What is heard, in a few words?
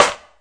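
classic hip hop snare; Classic Hip Hop Mega Kit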